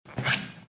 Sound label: steps on rubber